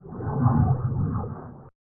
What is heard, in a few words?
rocket; sci-fi sfx